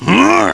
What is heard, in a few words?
huh; GBA; MLSS; Bowser